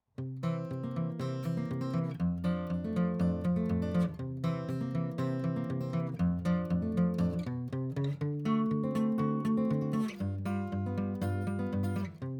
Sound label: gibson hummingbird